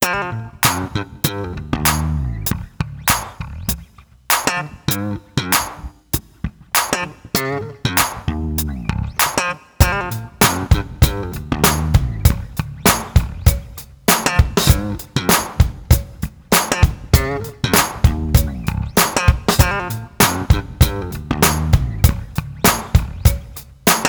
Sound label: Spaceship Jam